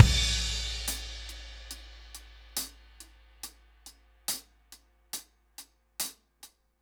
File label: Ballad Loop, drum; Sonic Foundry (Sony Creative Software); Loops & Samples Drum Tools